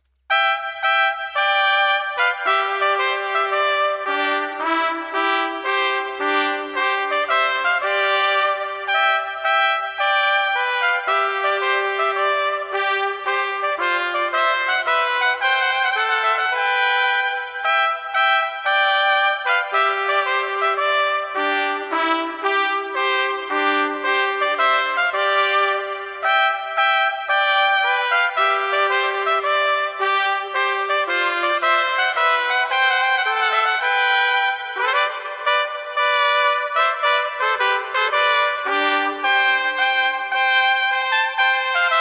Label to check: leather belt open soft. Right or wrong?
Wrong